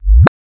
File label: Kick Alt Blip Rev